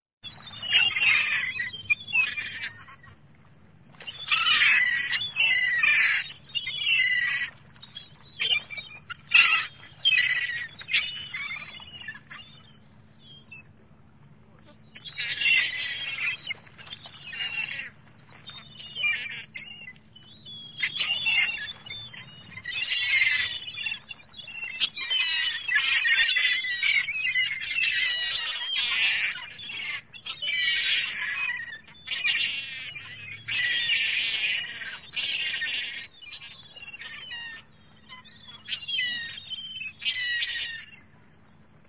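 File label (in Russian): стайка чаек кружит в воздухе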